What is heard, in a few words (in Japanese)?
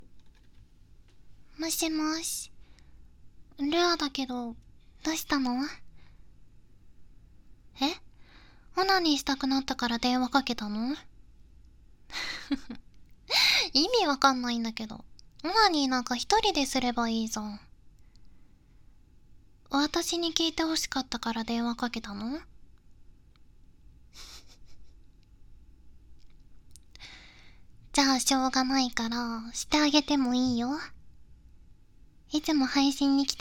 オナ電; 黒髪るあ！がＨな配信者になってリスナーと、るあを独占＆癒しプレイでオナ電！